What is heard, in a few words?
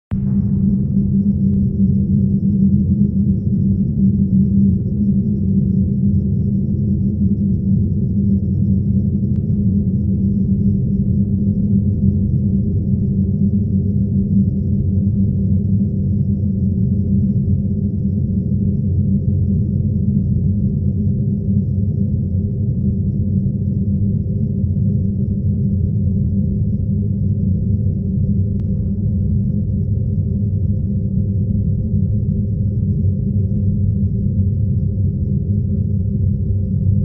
birds